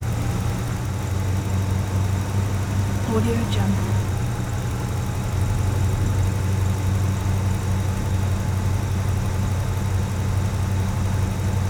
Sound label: 1966 chevy impala car accel drive slow constant 30mph